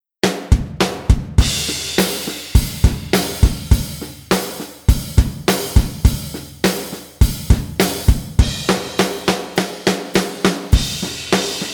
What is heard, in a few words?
Arena Rock; SUPERIOR 2; Hit Factory